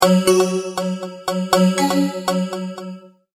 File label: Multimedia Tone-Thinking/Processing